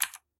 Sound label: keyboard type; Golden Crayon Sounds